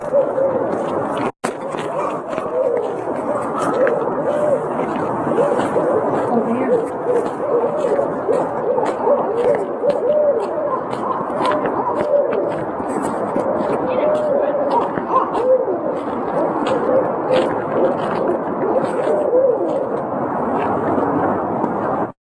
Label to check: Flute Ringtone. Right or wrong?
Wrong